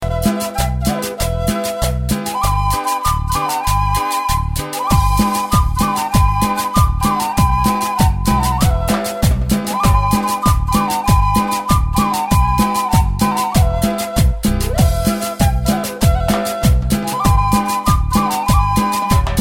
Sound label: Indian Latino